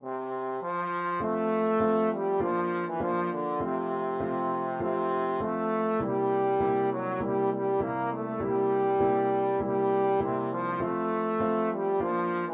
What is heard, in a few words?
aloha Oe, trombone